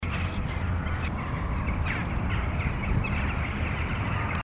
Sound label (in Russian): zvuki ptic